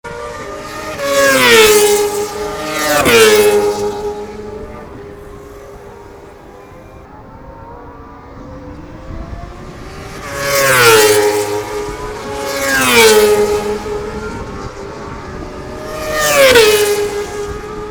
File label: Racing Sound, NOTIFICATION